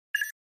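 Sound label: Flash Beep Low Double Buzz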